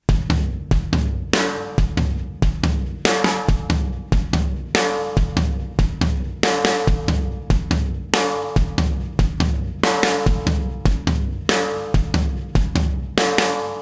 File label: Drums